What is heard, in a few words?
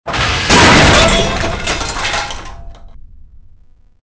metal; Soldier Of Fortune II Double Helix; XBOX